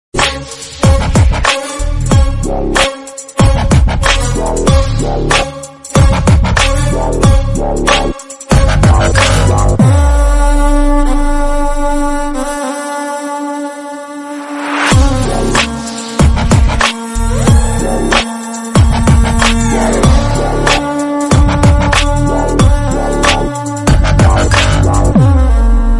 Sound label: Villain BGM Ringtone; ringtones; Jawan